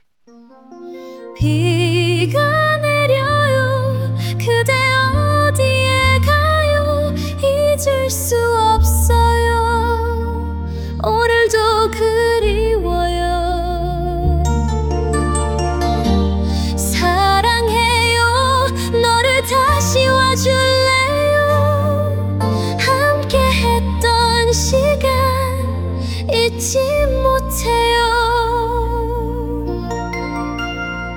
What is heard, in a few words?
80s ballad F; acestep